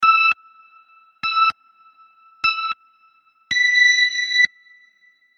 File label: Countdown Beeper